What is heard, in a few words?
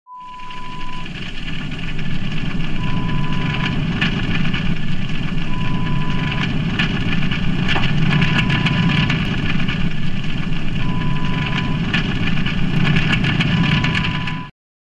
Fire sound